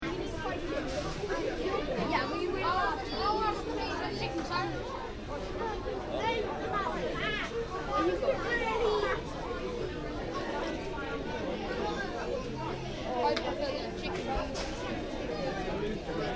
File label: Interior burger restaurant, Burger Restaurant: Interior, Ambience; Restaurants; Backgrounds; Stuart Duffield